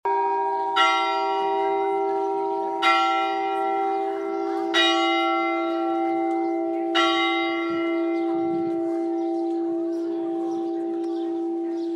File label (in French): cloche